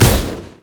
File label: heat seeking; LoL; battlecasturgot